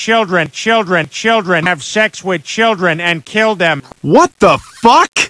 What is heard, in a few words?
children